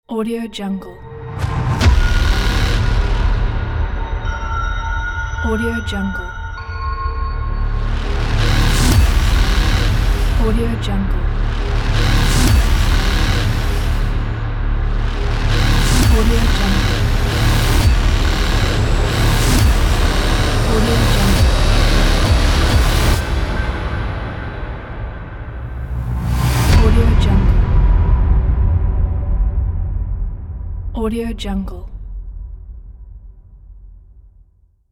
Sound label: blockbuster trailer intro music